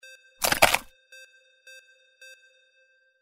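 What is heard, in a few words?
IMPACT ICE SMASH; windy beach audio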